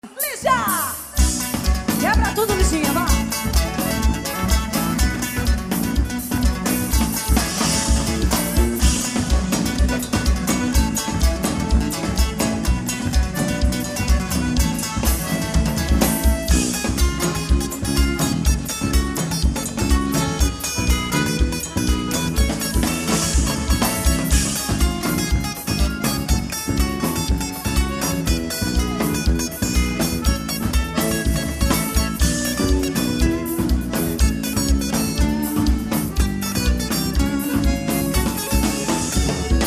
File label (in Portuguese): instrumental guitarra